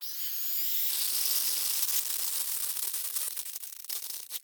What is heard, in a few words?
Nasal Spray Squirt; Medical; Blastwave